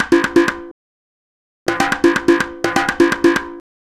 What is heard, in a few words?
Electronic Percussion